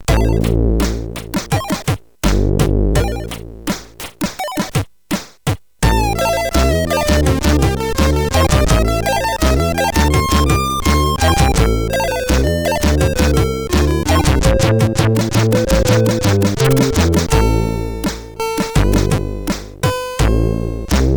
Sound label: Beat, CSG8580R5; sid